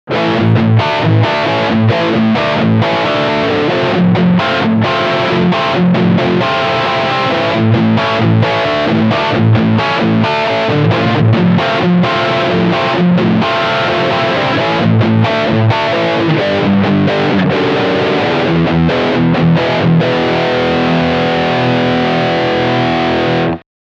Riff Steg humbucker

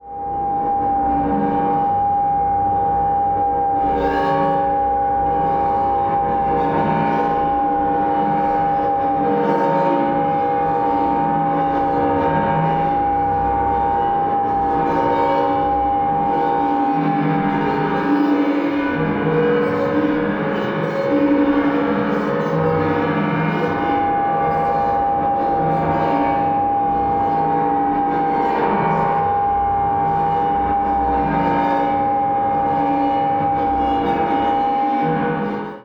Korg volca modular Weltraum

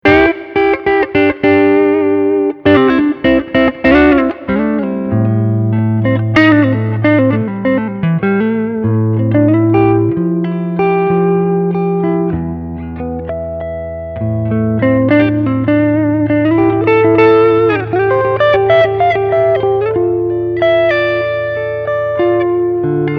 Narrowfield Hals Clean

